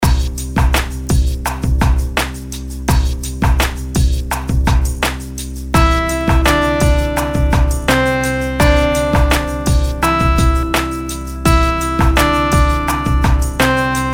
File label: melodies simple Cmaj; melody